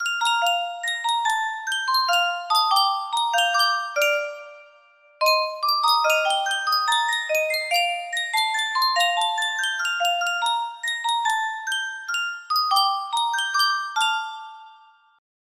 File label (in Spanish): Yunsheng caja de musica, music box melody